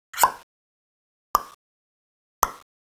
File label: pop; TRUTH-HZ; Crowdsourced Sample Pack